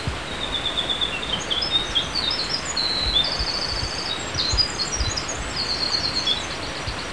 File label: Winter Wren